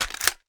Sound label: smg mag in; Guns MagIn; civ13; civ14; Weapons